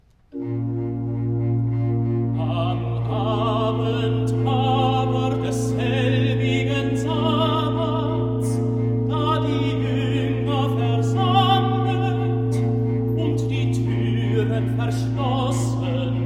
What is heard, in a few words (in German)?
Rezitativ Tenor; Am Abend aber desselbigen Sabbats